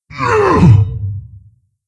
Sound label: Attack, Creature; Steelbreaker